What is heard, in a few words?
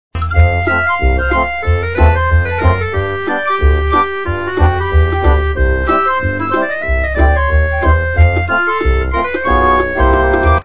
Happy Dance